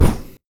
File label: Cloud Poof